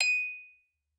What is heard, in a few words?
musicbox